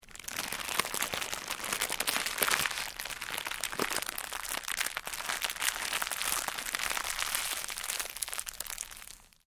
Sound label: Cellophane crinkle; Material components for constructing more complex sounds; Plastic; Sound Effects:Materials